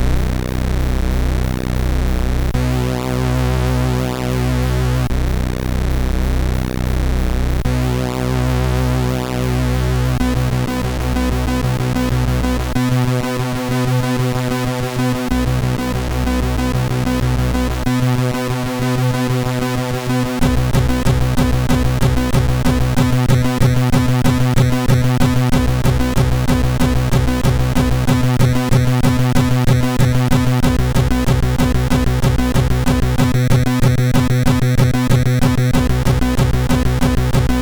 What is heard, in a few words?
Techno, MOS6581R3